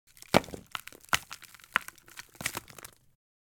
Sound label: Wood Impact Wood Sawdust; Antisample; Rocks and Stones